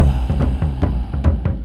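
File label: Music Shield; From Dust; PS3; Xbox 360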